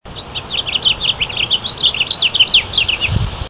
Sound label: house finch